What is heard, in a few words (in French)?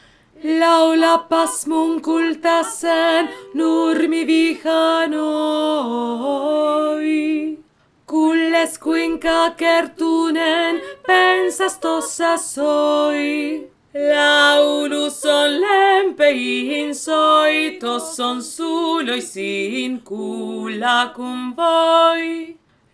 voix grave